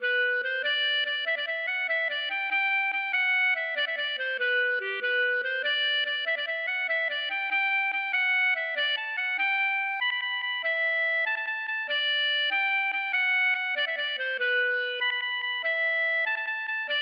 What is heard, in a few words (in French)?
bourree; Chapelotte